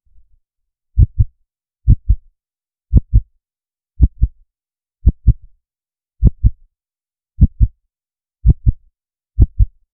latidos de corazn, heart

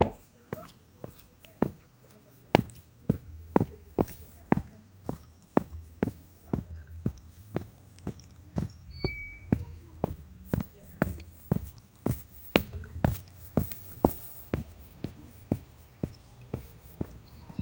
una persona abre una puer, foley

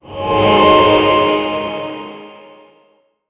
magic

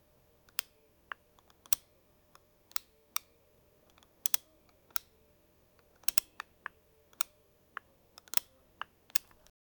descubriendo una carta, card game

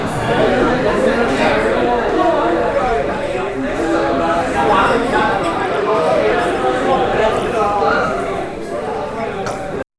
ambiente de un bar

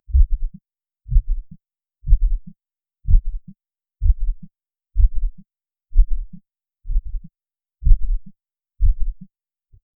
latido de corazn lento pr, heartbeat

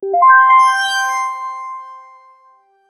estilo synthwave 80s

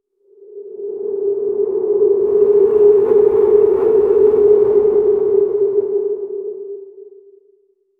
sonido de agujero negro

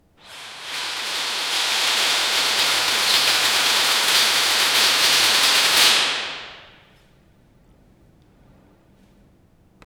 telon de escenario abrien, theater

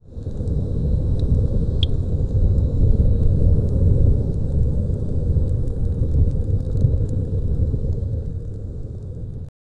rugido sordo del volcn, volcano

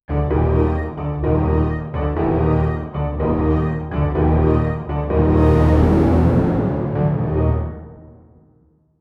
terror suspenso drama ten; horror

